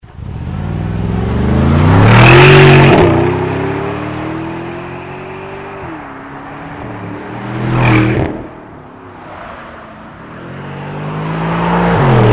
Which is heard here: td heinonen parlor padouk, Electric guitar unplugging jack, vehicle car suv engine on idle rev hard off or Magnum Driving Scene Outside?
Magnum Driving Scene Outside